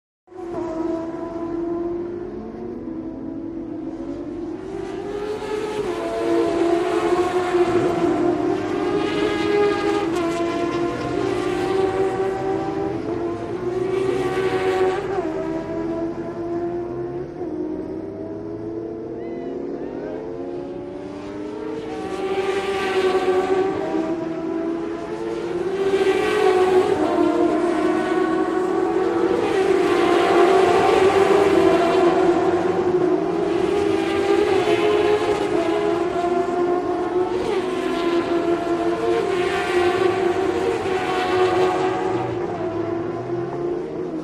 Motorcycle Prac Amb, road racing

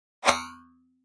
Pop Up Short Elect Whi, pop up element

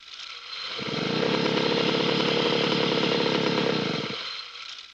Chainsaw Forward, Reverse Loop; chainsaws

